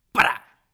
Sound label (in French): chaise bois tombée, Mobilier